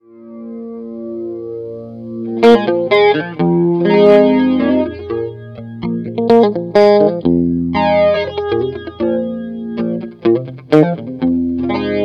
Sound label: flanger